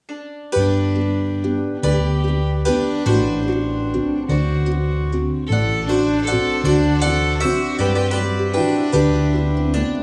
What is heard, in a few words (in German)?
Walzer aus Salzburg